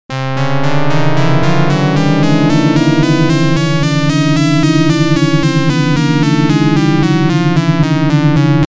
Organ Doremi

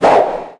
Swing Powershot, golf; Pangya; Fantasy Golf

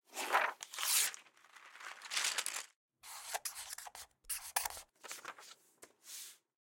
sequence drawing

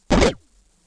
machine

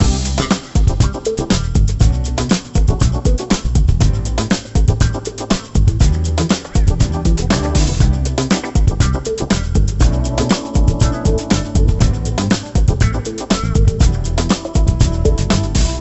Music; Imagine Interior Designer; 2008 NDS gamerip

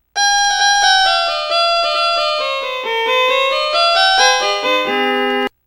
Violin (twenty five notes); Harvest Moon: Magical Melody

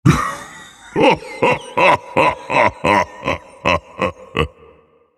Vocal Laughs; Minecraft Story Mode; Colossus

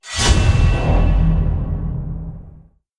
UI Unlock Skill Tree Node

